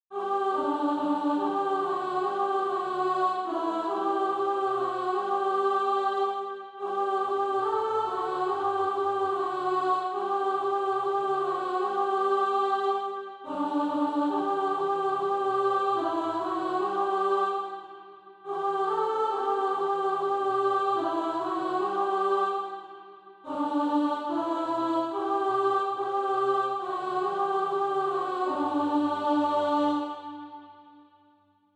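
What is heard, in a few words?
alto, Practise Music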